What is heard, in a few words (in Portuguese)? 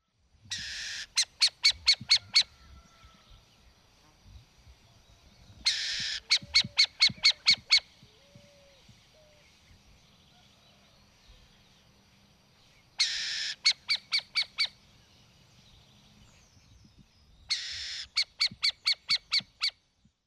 Coruja buraqueira Athene cunicularia; Jacauna